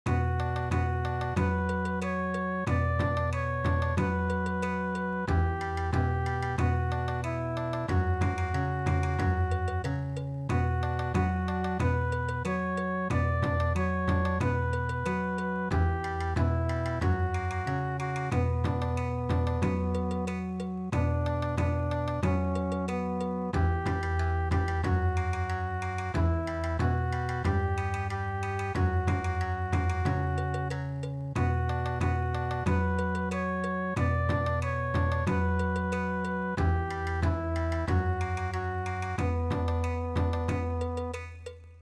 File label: Bon Odori, midi; Ruined Castle Moon